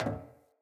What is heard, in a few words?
Mockery Walk Metal, Footsteps; Mockeries